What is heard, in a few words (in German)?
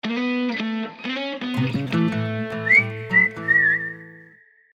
Soundlogo